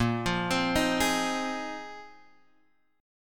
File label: Bb 7th Suspended 2nd Suspended 4th, Guitar, Open G